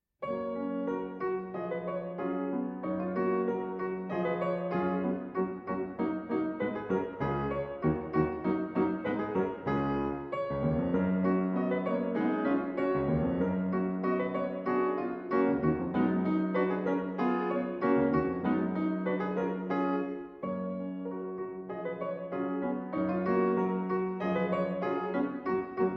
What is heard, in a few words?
Russian Folk Songs; Tchaikovsky 50 Russian Folk Songs, TH 176 - 29. Our Wine Cellar